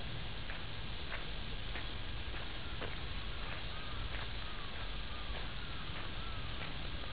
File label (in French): bruit harajuka